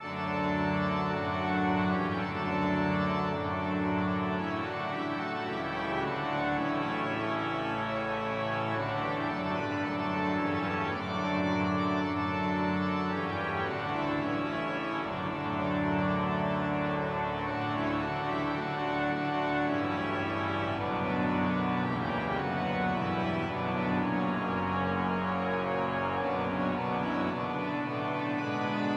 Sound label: organum venezia; virtuelle kirchenorgel; Best Service; V3SOUND; Symphonia Concert Library